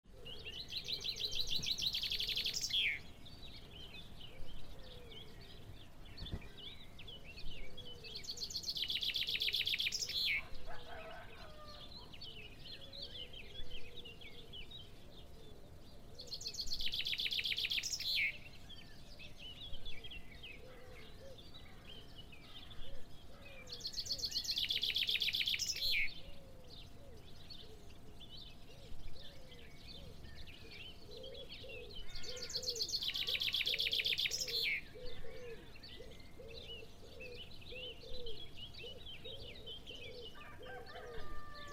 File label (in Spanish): sonido del amanecer en el campo canto de las aves sonidos de la naturaleza